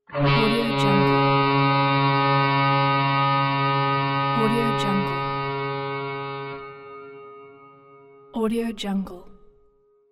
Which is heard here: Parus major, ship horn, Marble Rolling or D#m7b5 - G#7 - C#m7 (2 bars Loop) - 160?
ship horn